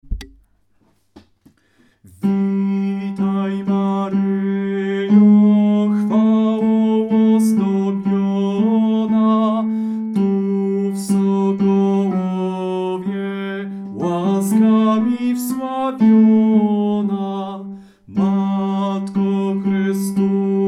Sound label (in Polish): tenor; Witaj maryjo